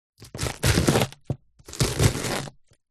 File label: food cabbage cut slice knife